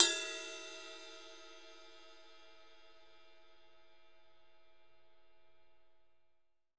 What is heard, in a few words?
ride 12; E-MU Producer Series Vol. 7 – Old World Instruments